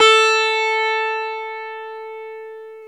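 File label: E 12 String guitar; Club-50 - Foundations Roland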